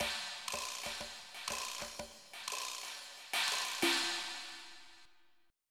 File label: JAZZ; TG500